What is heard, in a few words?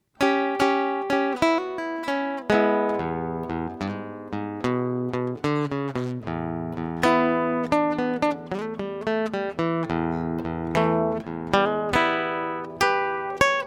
acoustic blues